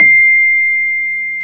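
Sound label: Piano Rhodes; Club-50 - Foundations Roland; FRhodes2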